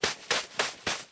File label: Sand Run; blue archive